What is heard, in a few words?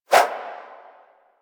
Generic Bow Load Whoosh; Warframe; Foley